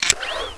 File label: zoom, weapons; poke646